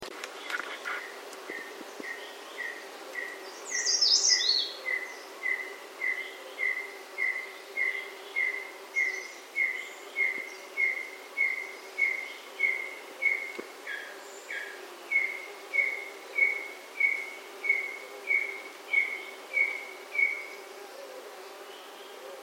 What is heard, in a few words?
Arborophila cambodiana diversa